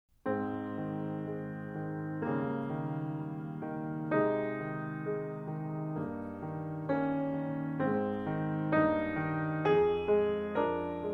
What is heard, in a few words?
Reflective Music